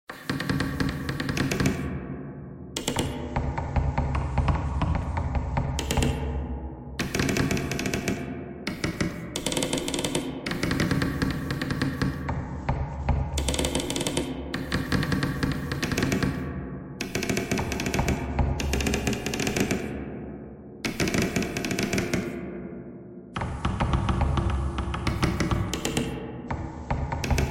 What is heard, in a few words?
bagpipe thread noise; reverb